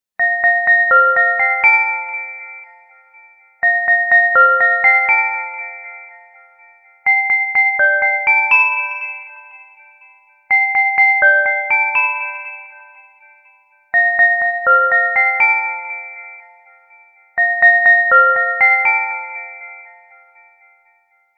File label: bell notification tune long